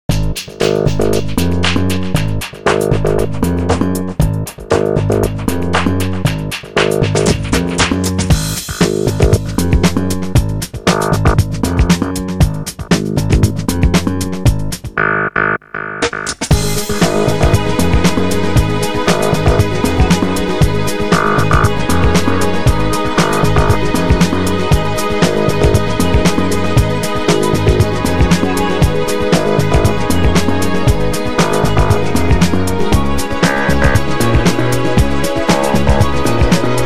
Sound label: Pinball, Music; Pure Pinball